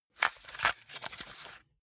cassette rattle medium

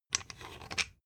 thread screw remove

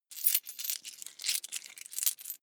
coins clatter heavy long

